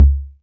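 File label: Olson BD C, bass drum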